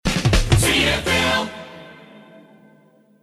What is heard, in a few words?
Shotgun Double Drum; CFL; Imagers